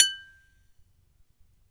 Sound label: saucer